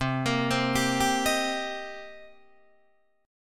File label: C Minor 7th C Guitar Open D